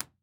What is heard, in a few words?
IR Bin Rear Right, Listening Room, binaural; IR Binaural 16bits